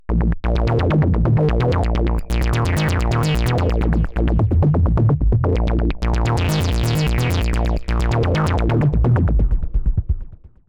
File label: Digitakt Slices Filter Envelope; Wuerfeln mit Slices